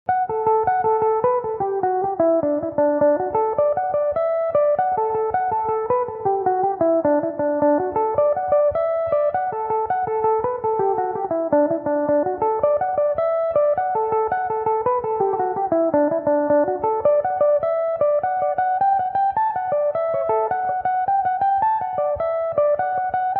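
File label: D major, irish mandolin, tunes; Belmuse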